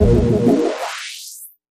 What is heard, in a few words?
Gritty Tone Transition